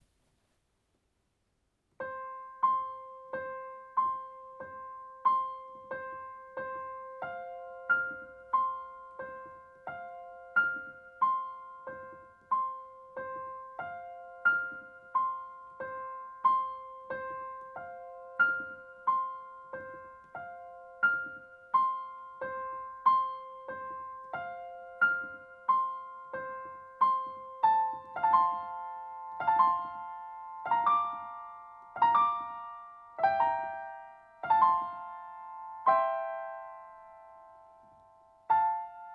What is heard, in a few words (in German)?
Klavierbegleitung; Die Bluemelein sie schlafen; bergische musikschule